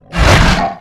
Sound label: pinky attack melee, monsters vocalizations